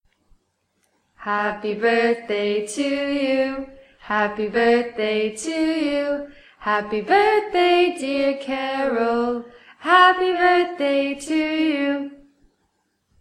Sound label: happy birthday song